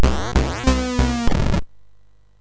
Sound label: Fire Breath, MOS6581R3